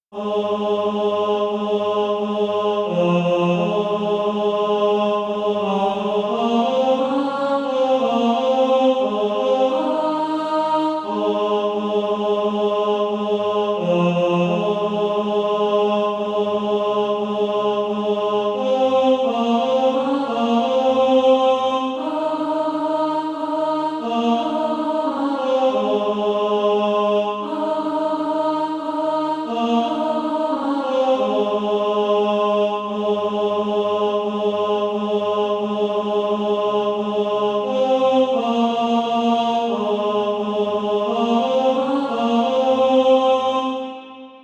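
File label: tenor, Practise Music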